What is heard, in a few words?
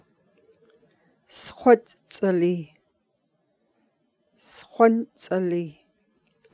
humming